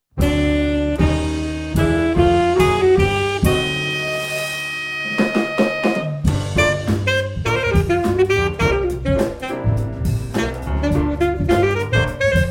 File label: funk machine sample; creative torbay